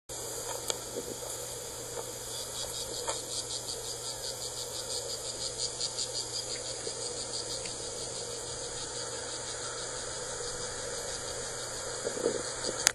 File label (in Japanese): モズの高鳴